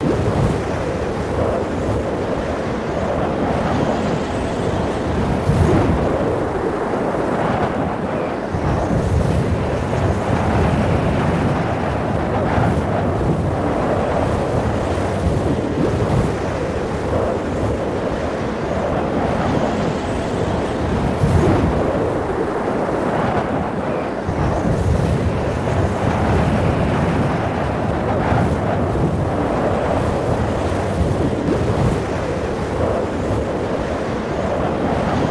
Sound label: ambient storm; bgm